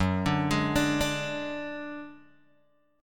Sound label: Gb Suspended 2nd Sharp 5th Guitar DADGAD